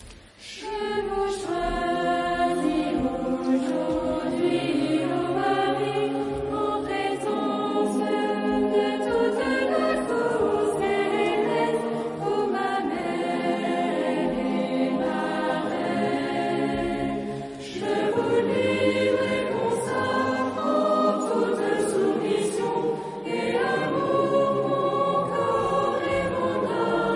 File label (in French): consecration a marie 4 voix